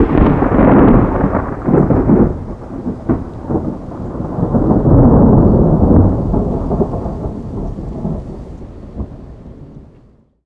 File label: thunder dist; amb elements; modern warfare 2